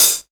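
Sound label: hi-hat closed; AKAI S6000 CD-ROM - Volume 1; DRUM RYTHM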